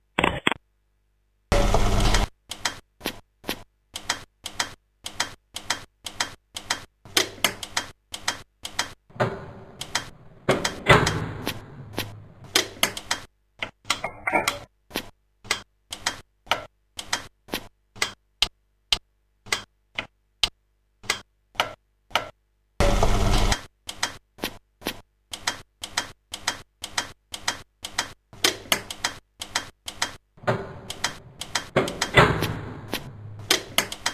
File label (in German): waschen; rauschpartikel